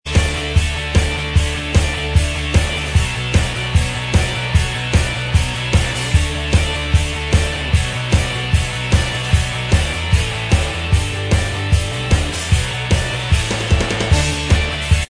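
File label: instrumental track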